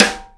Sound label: Stick, Drum Machines